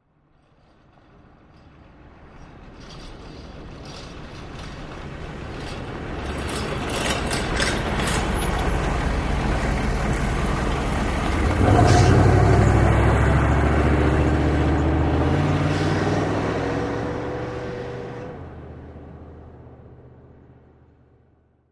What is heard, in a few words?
Humvee Slow By Rattle